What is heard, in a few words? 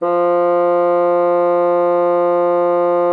FAGM F3, BASSOON; Xsample VOL-6